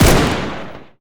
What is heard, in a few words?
fire, m16 weapons; Urban-Coalition; Decay; arccw ud